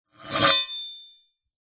warfare sword draw

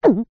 cartoon gulp swallow nervous

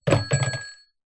household alarm clock with bell set down table

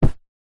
cushion grab hand

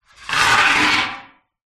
metal baking tray down on surface; household; zapsplat

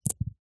industrial metal ball bearings x2 down on carpet

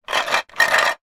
toy shape sorter plastic movement

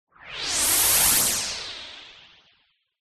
sound design sweep white noise filter

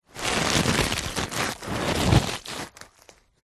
stress ball rubber squeezes; foley; zapsplat

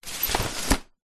foley umbrella open

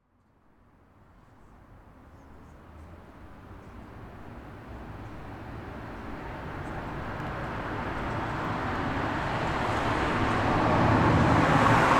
vehicles traffic cars very few pass town centre; 10pm

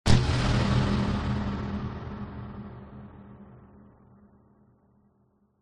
cinematic hit powerful dark tense; sound design

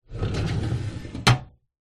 office metal drawer open; zapsplat

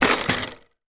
transportation bicycle fall over; sound effects one

